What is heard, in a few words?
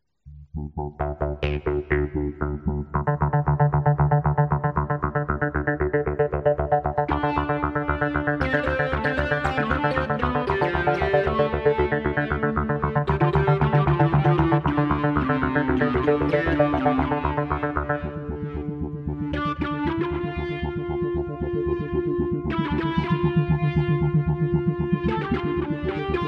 Arp Fuzz